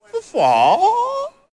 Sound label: Fart